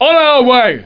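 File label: sword; LORDS2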